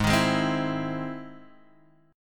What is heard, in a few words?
E Augmented Major 7th